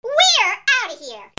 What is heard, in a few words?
CARTOON